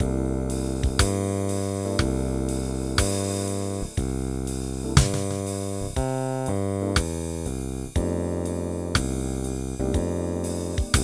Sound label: 120 primitive